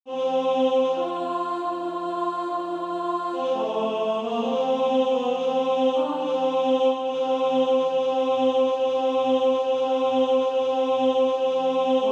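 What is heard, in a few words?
tenor, SATB Practise Music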